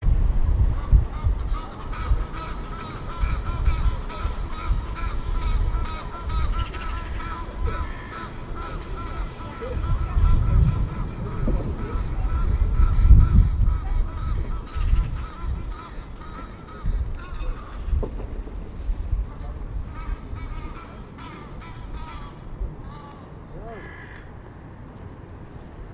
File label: excitable thames birds, great stereo echo